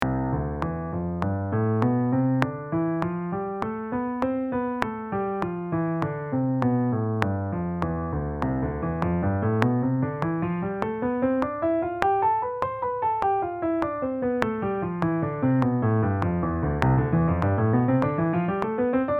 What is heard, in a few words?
Scales with quarter note click